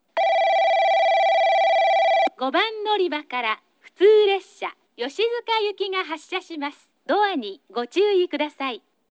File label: kurume local yoshizuka; 5番のりば発車放送（普通 吉塚行き）